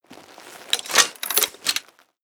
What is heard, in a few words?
crossbow quick reload